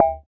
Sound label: Syn Swt Atk, FX; Roland BK-5